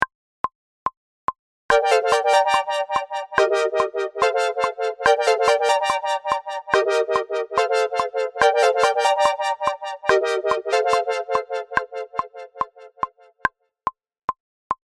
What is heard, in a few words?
Intro chord L